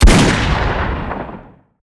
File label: msr fire; STALKER